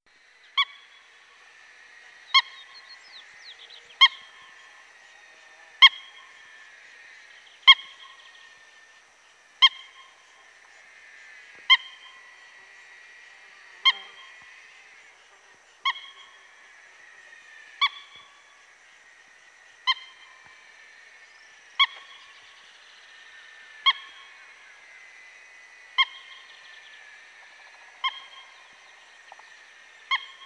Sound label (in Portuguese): frango d'agua; fauna